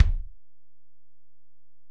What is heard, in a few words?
Ac BD, Alesis DM-PRO; 300 Drum Machines